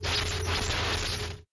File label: lightning